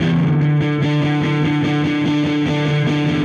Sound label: Dirty Chunk Guitar, Indie Rock